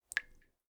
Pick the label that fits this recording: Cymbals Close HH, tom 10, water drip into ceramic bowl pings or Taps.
water drip into ceramic bowl pings